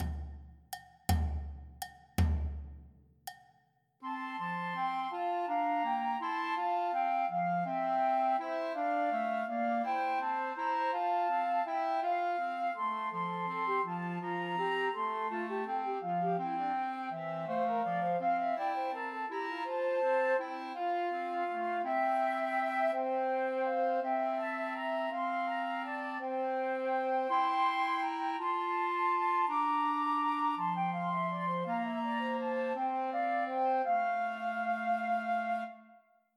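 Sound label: Trios for Two Flutes and Clarinet; Sussex Carol; Christmas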